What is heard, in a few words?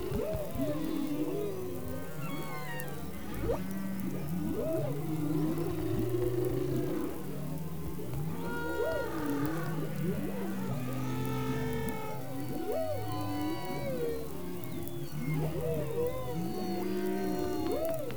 chorusing